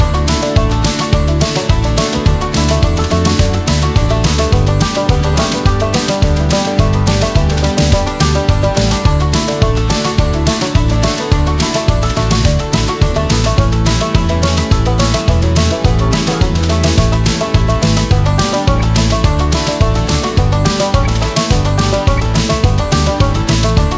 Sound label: Banjo Fast Picking Country Music; 60 SEC; AUDIOLANDER